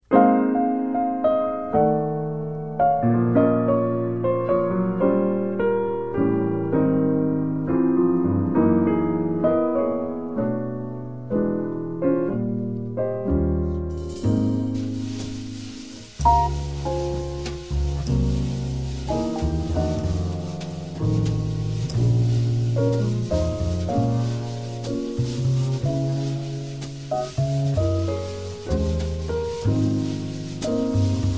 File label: minus melody